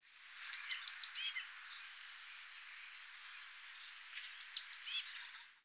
Parus montanus

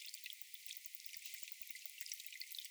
bottlenose dolphin clicks

